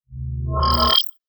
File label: Space hole